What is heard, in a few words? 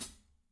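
closed hi-hat, natural kit; Spectrasonics; Burning Grooves CD2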